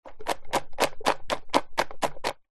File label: craft thin foam letters shake in plastic tub; office; zapsplat